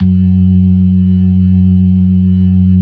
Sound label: LO MAN V L, LOMANUAL VIB; InVision Interactive; Keith Emerson